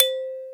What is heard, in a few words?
kalimba C5